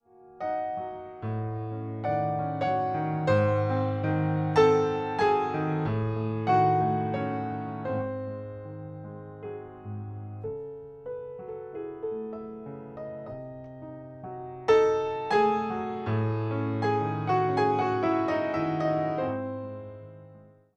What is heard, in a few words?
Piano Dry; Verbtone